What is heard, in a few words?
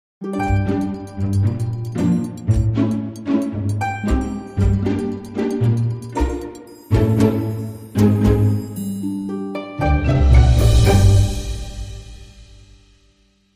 Dramedy Sting